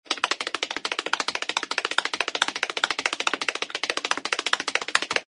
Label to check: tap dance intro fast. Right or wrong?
Right